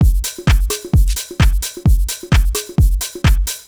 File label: Drum Loop 130, Downtown House; Drum Loops 130bpm